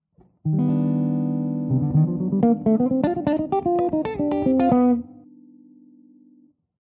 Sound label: Lydian Jazz Lines; Lydian 5 Jazz Lines